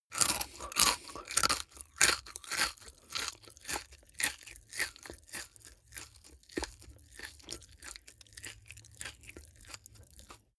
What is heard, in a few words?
eat crisp mouth open